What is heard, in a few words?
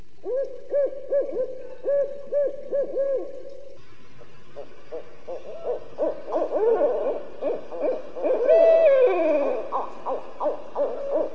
barred owl vs. great horned owl, calls